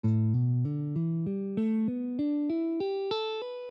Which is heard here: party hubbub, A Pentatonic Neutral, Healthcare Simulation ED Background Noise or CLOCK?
A Pentatonic Neutral